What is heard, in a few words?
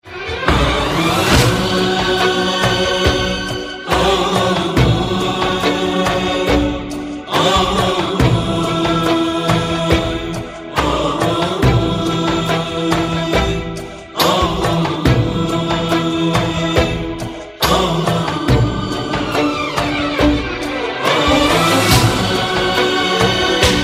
Ringtone Bikalam